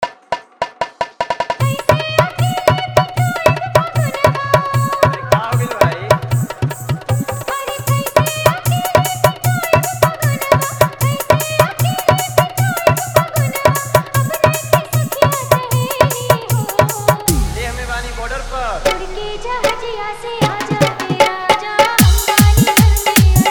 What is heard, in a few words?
Desh Bhakti Holi Fully Dhollki Bass Mix